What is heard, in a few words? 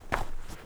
footstep trainers snow compact walk fast; Phasmophobia